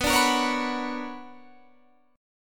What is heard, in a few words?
B Minor Major 9th B, chord